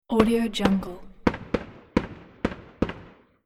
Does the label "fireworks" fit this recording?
Yes